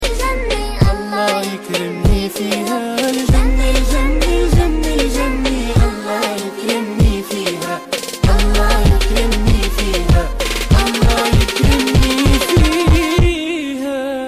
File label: Remix Arabic Ringtone; Mobile